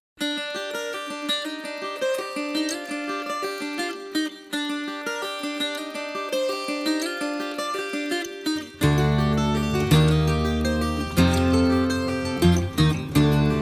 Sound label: Circular Breathing